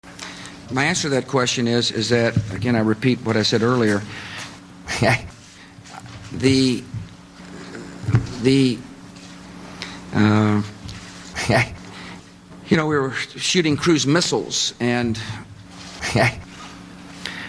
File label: long with laugh